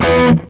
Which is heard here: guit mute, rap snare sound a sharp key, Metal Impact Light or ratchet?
guit mute